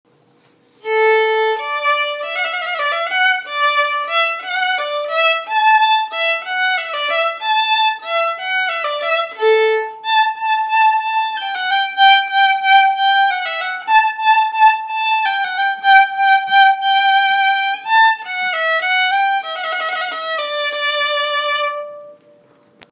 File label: Trumpet Voluntary solo